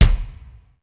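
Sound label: sr16 bass drum; sr16 bd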